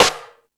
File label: Percussion FX, Drum Machines